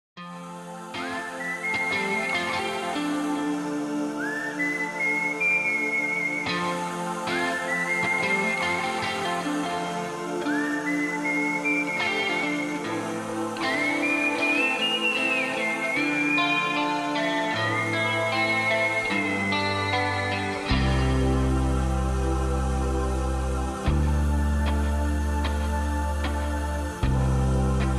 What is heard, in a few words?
fragment, altosax